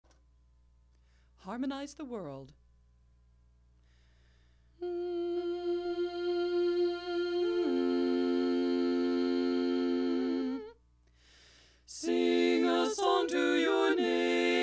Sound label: Harmonize bass miss; Har bass omit